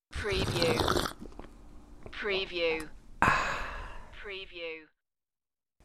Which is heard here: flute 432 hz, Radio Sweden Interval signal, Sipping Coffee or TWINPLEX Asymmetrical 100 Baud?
Sipping Coffee